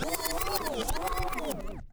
back spin long, vinyl record

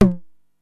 TOM H, 909; musicradar-synth-samples; Classic drum machines; 909TOMS

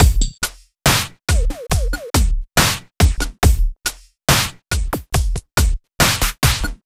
Slomo Beat 140 BPM, Loops, Slow Motion Beats

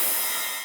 Cymbals Ride; Vengeance Samples

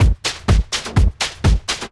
drum loop 125, flying filter, vocal house; PS-28 Vocal House Samples; drumloops

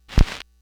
Needle Drop Old LP, Vinyl Record Samples; Needle Noises

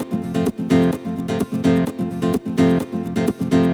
Nylon Guitar F maj, 128BPM; Vengeance Samples; VEH3 Nylon Guitar Kit 2

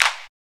Claps; Vengeance Samples

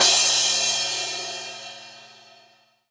Crash, Cymbals; Vengeance Samples; VEH2

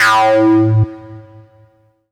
303 Acid A; Vengeance Samples

